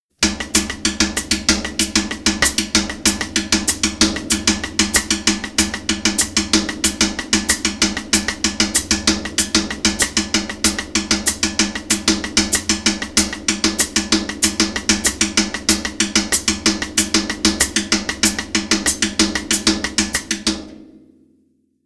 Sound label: Cascara Groove; Schwarzes Album; Bum Bum Tschack Jazz Latin